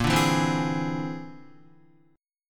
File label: A sharp 9th Flat 5th A sharp, Guitar; strummed; JGuitar